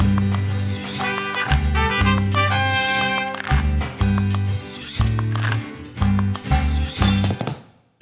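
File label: food world music inferno suspense no vox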